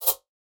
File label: Slash